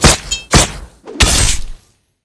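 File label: Attack Claw; Seer; GamigoNA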